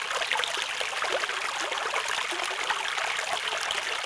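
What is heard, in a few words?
water flow loop; water splashes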